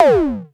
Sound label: tom; Klone Dual Percussion Synthesiser